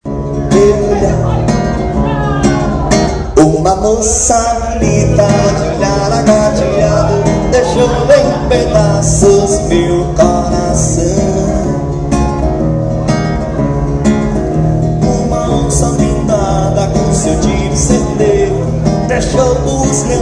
acustico; onça pintada

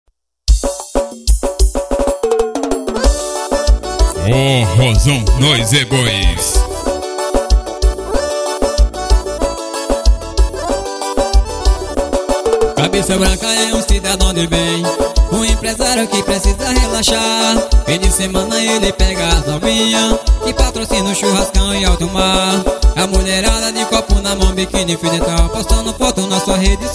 ritimo de forro

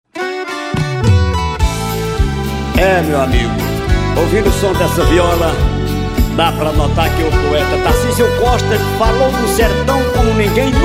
moda de viola

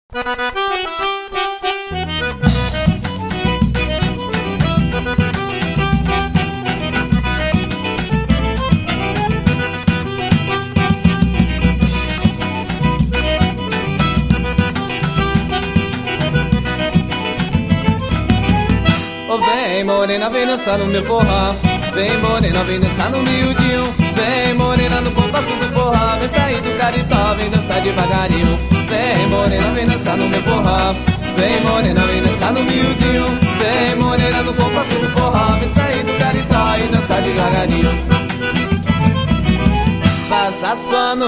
forró

